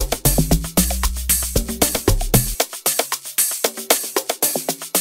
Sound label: Amapiano